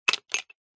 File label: foley shell set down onto a few others